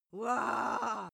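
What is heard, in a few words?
female aggressive, screams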